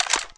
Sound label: scout bolt; cstrike; diliulsound